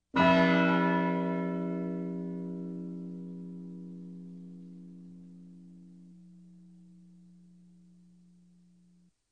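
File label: clock striking; Boy chasov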